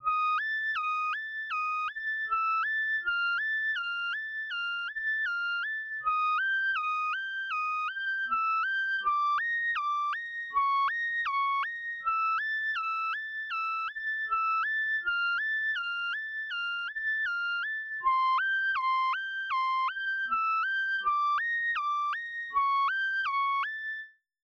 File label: synth